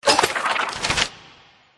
Click Activeskill; The Great Ottomans Strategy Battle for Throne; android

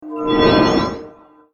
Magical concealment Start; Skill Blessing Magicalconcealment Start

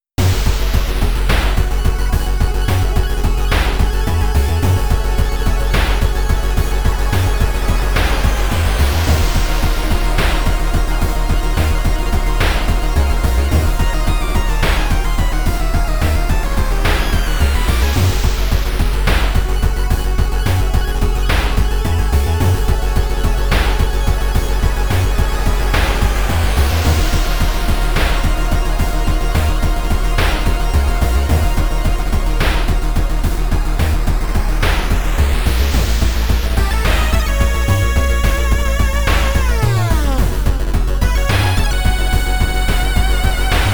Forest Combat; Bell of Horror; World of Horror; Qwesta

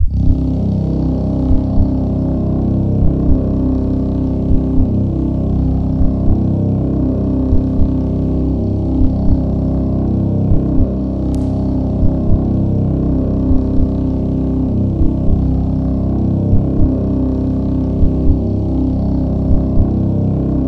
Heart Hum; A Masquerade in the Woods

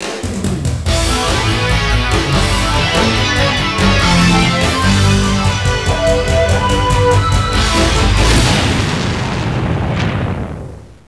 Fanfare; stargunner; msdos

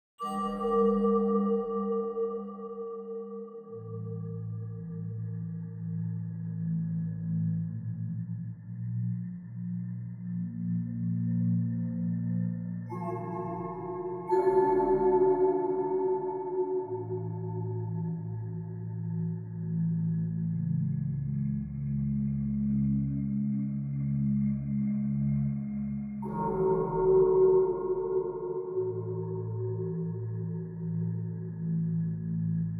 Snoring Forest - Normal

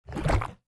Ink Jump

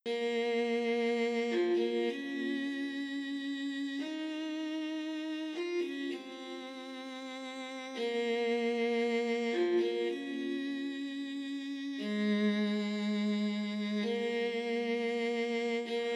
strings theme; cutscene music 12 - strings theme longer

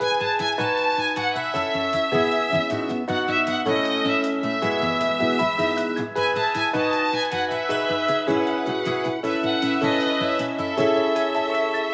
Gentle Breeze

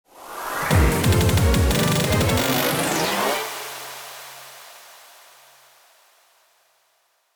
Jingle Gacha; Goonya Monster

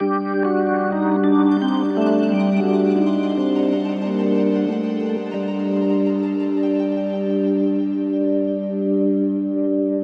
Organ Loop 180bpm; jenny leclue detectiv

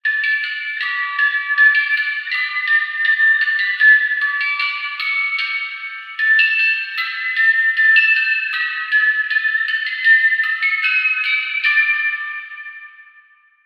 Music Box Reverb

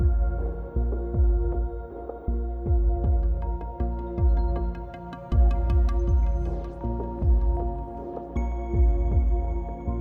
Ambience 79BPM

